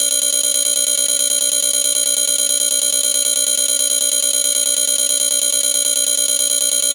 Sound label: science fiction console alarm; sound effects one